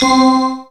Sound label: synth c, Top End Tinklers; Dance Synths